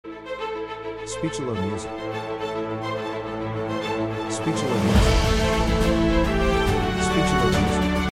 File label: epic trailer